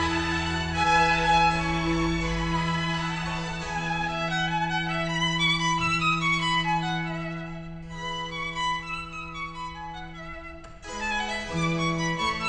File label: classical